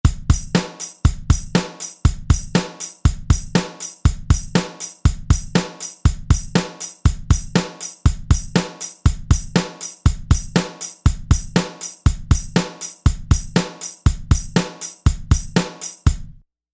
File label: Pop